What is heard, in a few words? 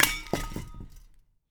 steampunk weapons grenade canister bounce; soundmorph